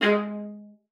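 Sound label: G#5, viola spiccato; Xsample VOL-5; 90 s Sample CDs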